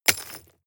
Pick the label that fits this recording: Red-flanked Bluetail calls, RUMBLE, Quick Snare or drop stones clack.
drop stones clack